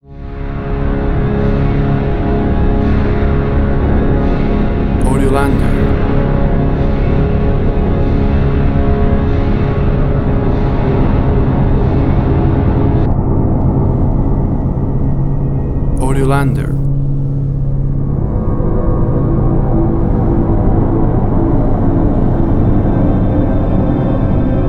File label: Horror Build Up